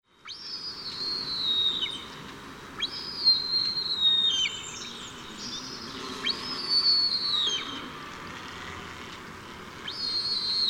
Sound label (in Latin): Gypaetus barbatus